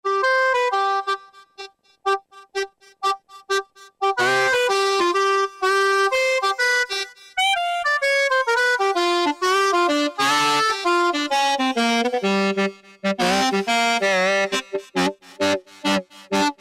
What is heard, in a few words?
WN Bluharp, Yamaha TG77; Factory Presets